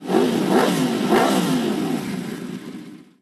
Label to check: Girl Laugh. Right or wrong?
Wrong